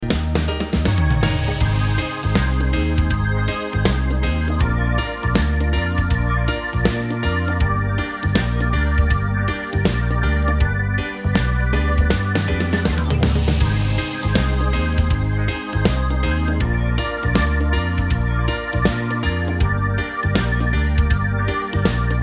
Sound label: reggae